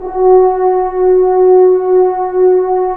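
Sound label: brass french horns, Roland; Roland - LA Composer vol. 1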